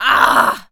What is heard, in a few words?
attack, Zombies; zps; Z Waitress